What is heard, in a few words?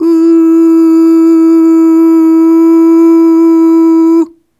Ooh Long E3 Dry; Sonic Foundry; Harmonic Building Blocks